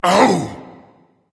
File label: ouch berserker; cuchulainn